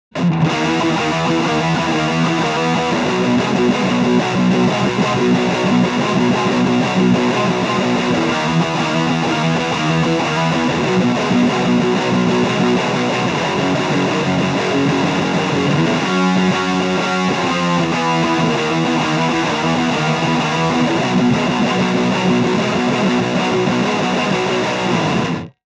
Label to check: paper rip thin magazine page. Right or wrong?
Wrong